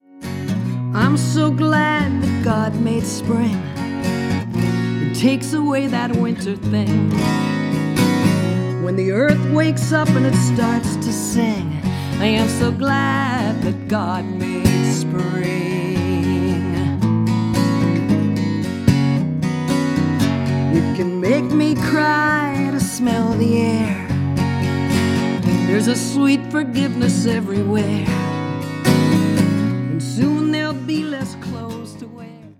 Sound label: Spring